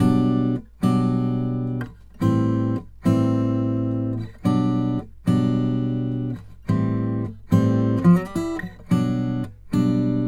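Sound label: Country Guitar (Bridge); Digidesign; Pro Tools Essential Loops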